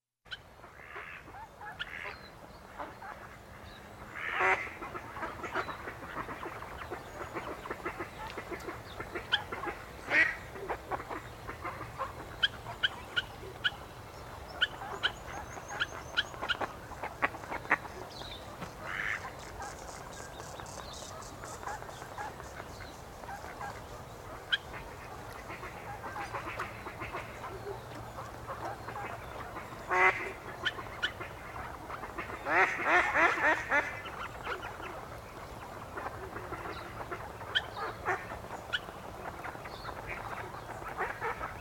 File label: Swans and Ducks